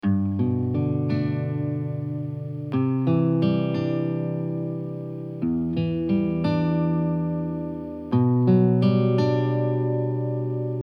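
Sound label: G 89 guitar arpeggios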